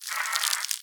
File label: Egg Shake Louder; Devour; The Inn